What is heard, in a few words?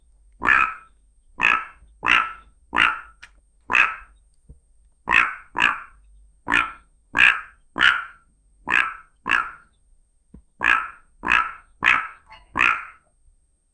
fat parrot croaks and bab, babbling